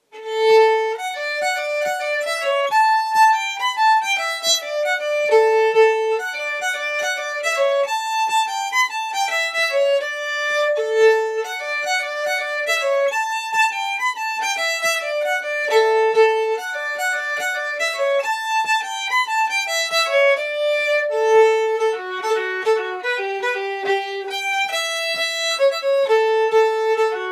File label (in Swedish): Polska; efter Kling